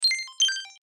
Video Game Blips High; Video Games; Technology; Video Game Sound Effects

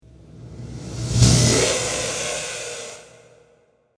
Weird Balloon; Balloon Sound Effects

